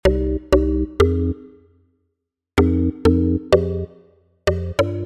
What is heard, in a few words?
Keys; PatchFactory